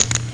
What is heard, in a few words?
coin; doom